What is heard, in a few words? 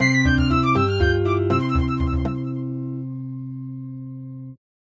reward; Tai Bwo Wannai Fishing